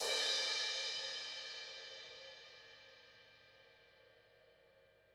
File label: Crash A Custom 18 Low Vol